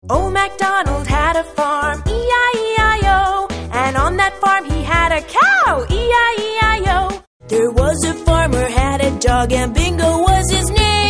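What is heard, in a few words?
American Songs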